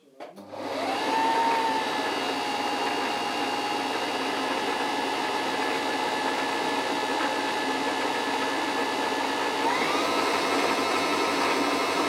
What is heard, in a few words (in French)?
machine à coudre